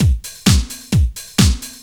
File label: drum 130 straight; Techno Trance Essentials